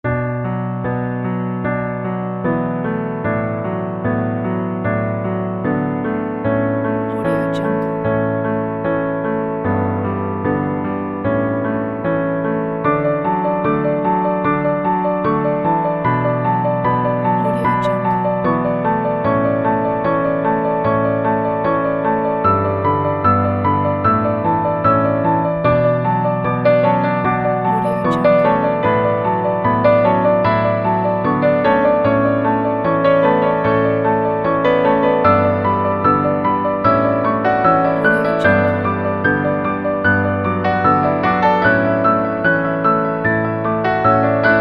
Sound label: two sentimental pianos